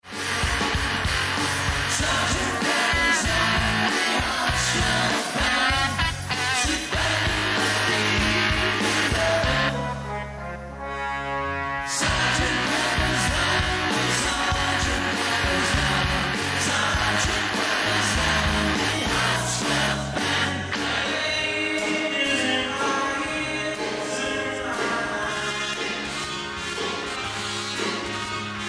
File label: Tribute Band